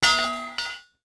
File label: pipe hit concrete; objects; Star Wars Obi-Wan; XBOX